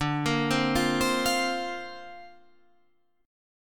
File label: D Minor 7th Sharp 5th, D, down, Guitar Half Step Down